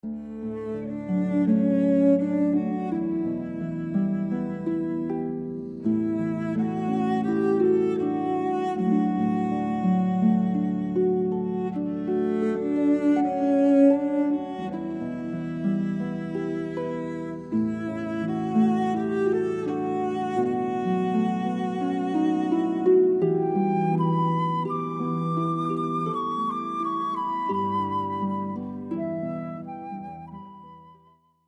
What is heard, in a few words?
Erev Ba, traditional Jewish, harp flute cello